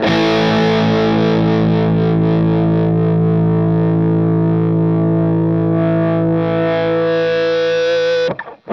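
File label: fuzz guitar vibrato; MAYFUZZ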